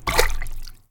Fluid Impact Hard; Puddle; puddle plus ios gamerip 2018

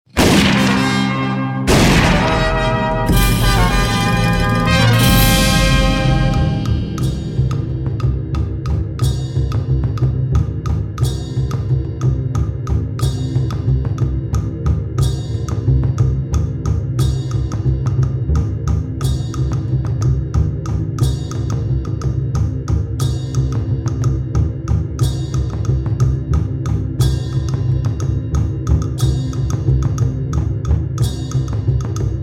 vs screen music; Secret Ponchos

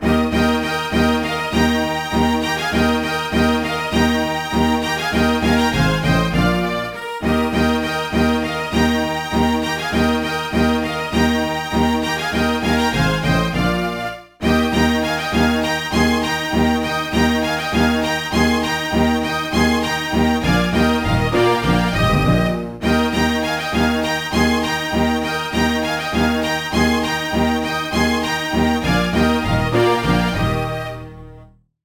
BGM ORCHESTRA; Music: Music for Everyone

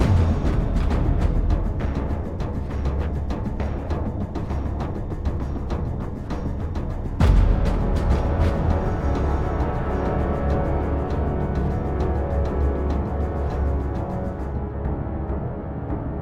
Steel On Steel Intense High Loop

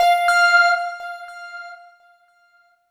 Text Feedback

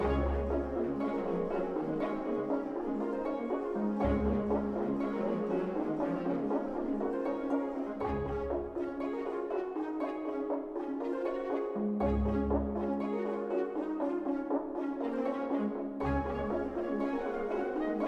Music Dialogue Neutral 60bpm Loop

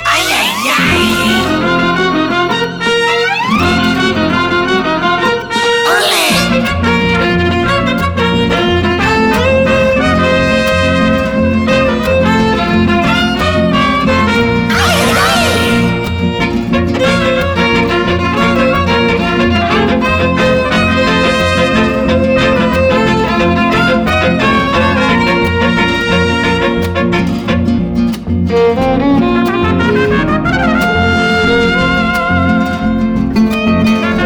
Character Theme; Awesomenauts Ultimate Edition

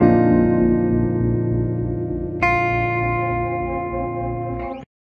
Tension Bridge 100bpm 2bar; project zomboid

